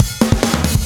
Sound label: Fill 140 BPM